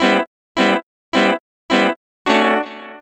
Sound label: 150, D min, Keyboards; Total Drum&Bass; 90 s Sample CDs